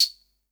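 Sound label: SR95 tambourine; Best Service; Dance Mega Drums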